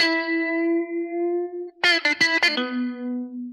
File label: guitar bended, Loops, Phonky